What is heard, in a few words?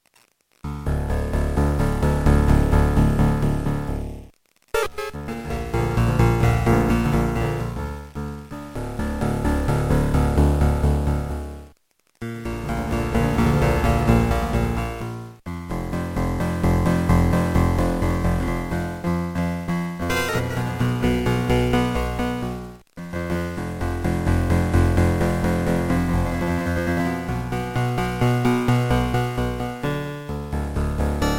Beepblaster 2.0 BEEPMODE; Descent II